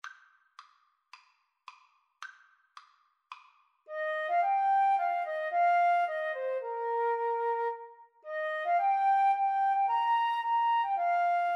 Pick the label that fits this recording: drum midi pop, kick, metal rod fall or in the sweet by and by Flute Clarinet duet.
in the sweet by and by Flute Clarinet duet